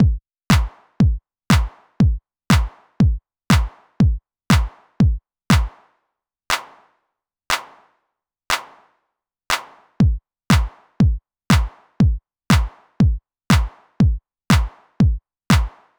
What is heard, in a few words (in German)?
Clap plus Kickweglasser